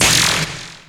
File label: scifi, SCIFI & SWEEPS; Techno Trance Essentials